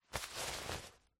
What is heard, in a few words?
foley jacket